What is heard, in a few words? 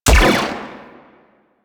Sniper Rifle Shot; Warframe; Weapons; Corpus